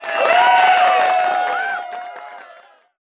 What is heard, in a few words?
Music Frontend